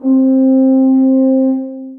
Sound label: Soft Brass Ensemble; Instruments; Bold Brass; Ableton; Factory Packs; Mood Reel